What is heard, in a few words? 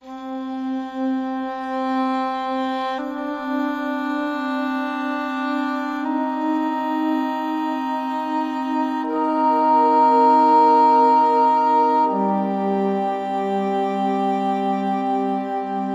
Woodwinds Only